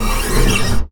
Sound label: SLIDE D2, BASS FINGER; Xsample VOL-5